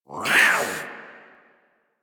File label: Feral Vocal Attack Short; Warframe; Catbrow